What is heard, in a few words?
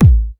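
hard bass drums; Bazzdrumz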